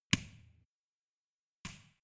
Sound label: switch small turn off